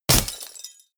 break glass small

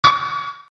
short ambient impact event

